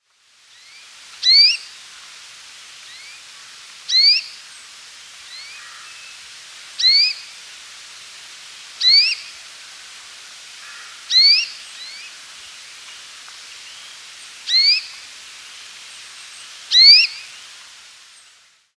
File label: pink browed rosefinch